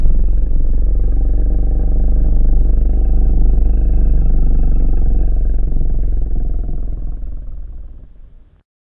Roar; lethal company

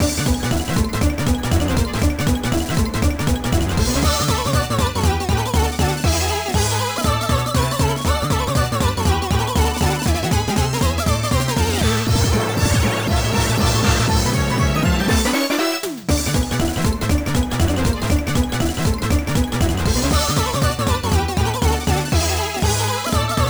Balloon March 3 (Stage 4 Boss Speed Up BGM); TwinBee Yahho! Fushigi no Kuni de Ooabare!!; Konami GX

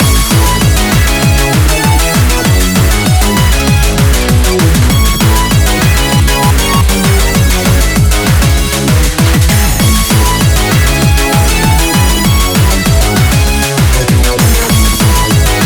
BGM Reach

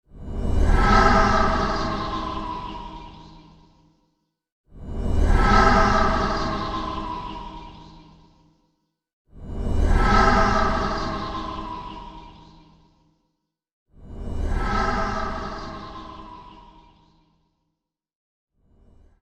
sight reveal

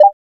CURSOR; gamerip; PS3

